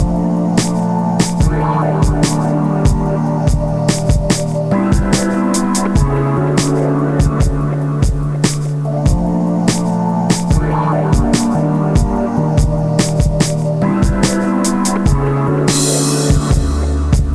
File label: shatter; xenoncity